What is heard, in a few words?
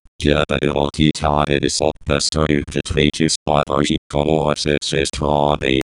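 cheerful and energetic, brazilian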